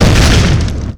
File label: large explosion